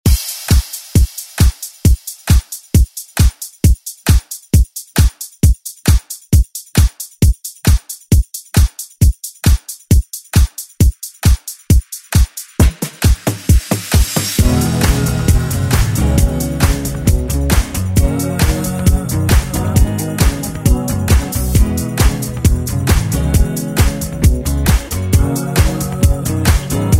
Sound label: 80s Re Drum; DJ Mhark